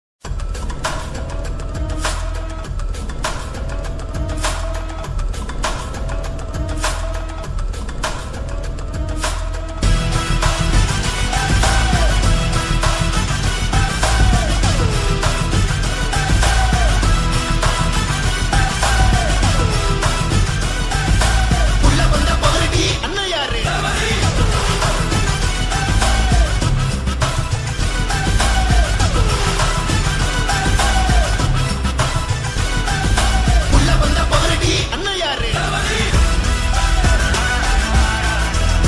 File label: intro background music; thalapathy vijay; master bgm